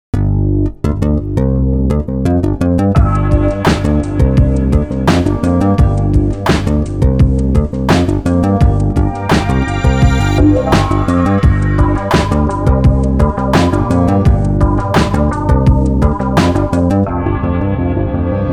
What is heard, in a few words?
downtempo